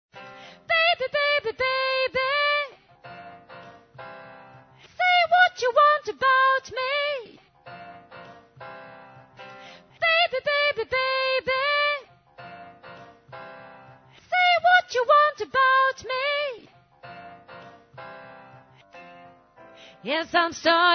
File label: tenor